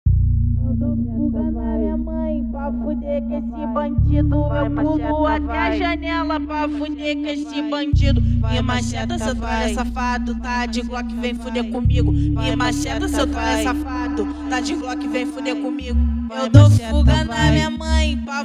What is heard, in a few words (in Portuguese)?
eletrofunk